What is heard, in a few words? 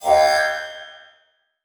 Flash Pop Up; Multimedia Internet CD-Rom Flash